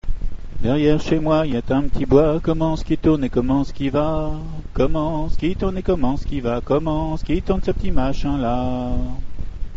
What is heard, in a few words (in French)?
Chant Gallo, Chant à Répondre